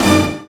HIT LO-FI, Orch.Hits; Roland LCDP08 Symphony Orchestra

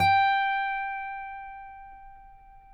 Finger G 5 R, Guitars; Spectrasonics; Hans Zimmer Guitars VOL-1

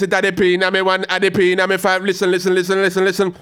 G-PHRASE, RAGGA IN G

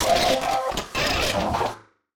Casio, Glitched, malfunctioning synth

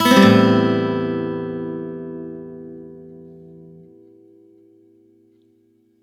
One Shot A min add9 upstroke, Acoustic Guitar; Sonic Foundry (Sony Creative Software); Songwriter Acoustic Guitar Companion

